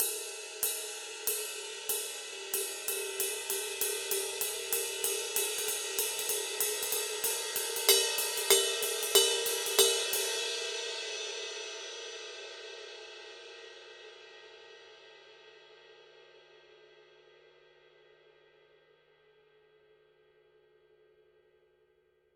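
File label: signature precision 20 ride pattern, cymbales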